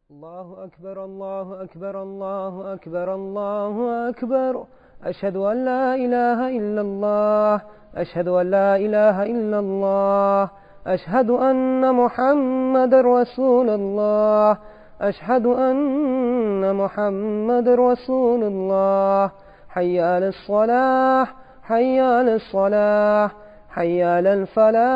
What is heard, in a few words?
adhan call to pray